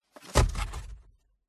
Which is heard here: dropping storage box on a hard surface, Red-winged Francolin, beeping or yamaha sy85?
dropping storage box on a hard surface